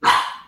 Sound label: Bark